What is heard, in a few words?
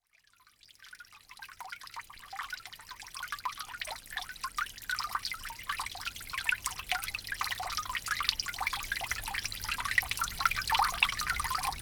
snow melting